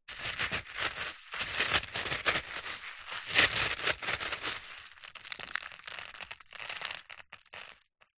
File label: small stones pick up then sprinkle; foley